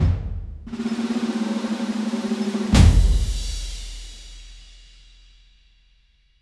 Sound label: big celebratory drum roll, orchestra